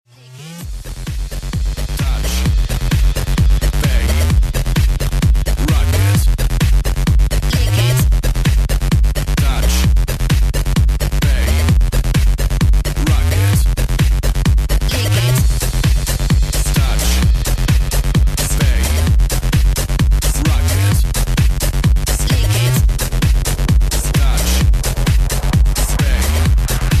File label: Elektro Remix